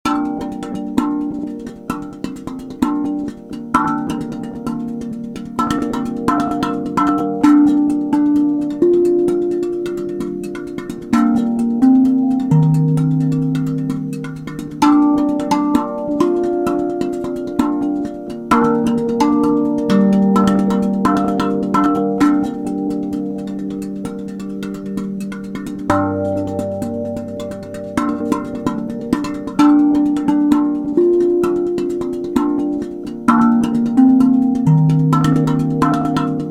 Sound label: pure solo, space drum; root sounds